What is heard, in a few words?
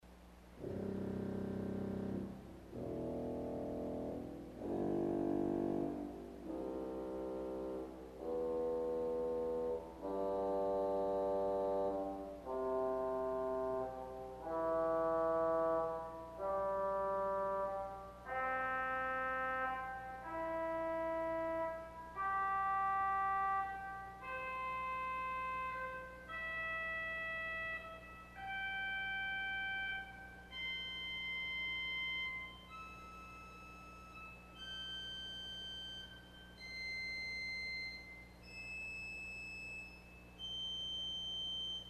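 Contra Oboe Arpeggio, organ stop; CulverAcademy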